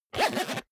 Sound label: zipper small case close fast